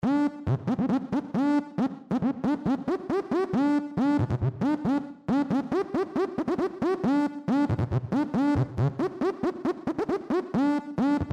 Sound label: beat